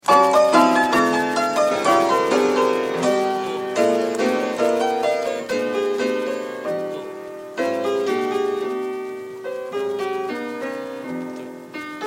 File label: pentatonic improved